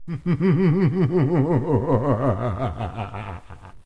Baa Monk Laugh

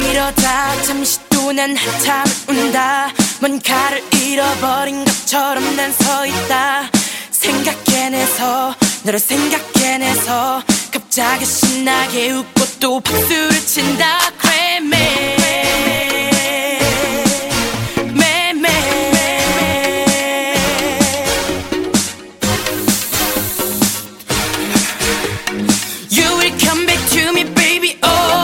clap

